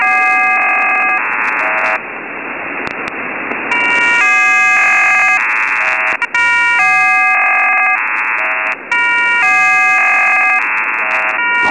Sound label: Chinese MIL 18 tone FDM 18 x 44.44 Bd QPSK